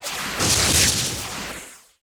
STEEL; Total Control - Action Cutz

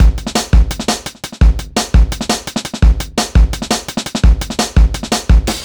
E Beat, Electronic Beats; Drum n Bass; Loops; Beats and Percussion; KJ Sawka; Drum n Bass & Beyond

